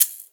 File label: hats rattle, Pop; Drums; Slate; SD PASADENA Pop Sample Pack